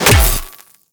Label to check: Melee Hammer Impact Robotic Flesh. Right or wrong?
Right